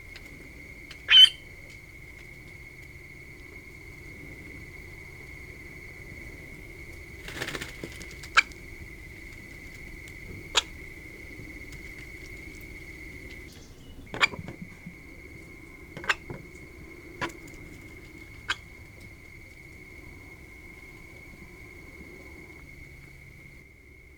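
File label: avdotka golos, stone-curlew voice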